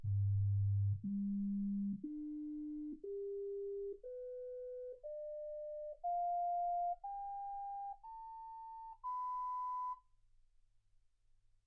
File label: sinus tones 48kHz